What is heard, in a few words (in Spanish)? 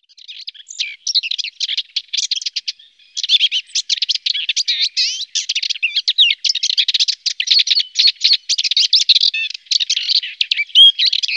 curruca carrasquena